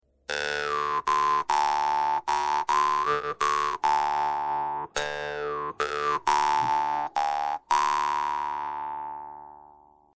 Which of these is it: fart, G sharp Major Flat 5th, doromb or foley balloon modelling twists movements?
doromb